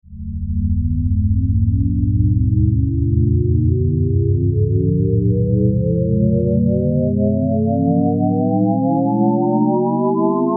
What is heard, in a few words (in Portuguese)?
barulho ascendente